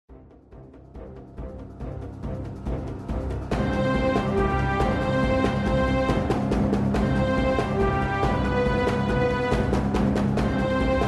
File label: theme orchestra